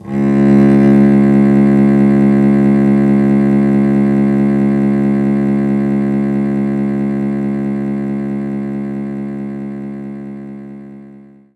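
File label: Cello C sharp 1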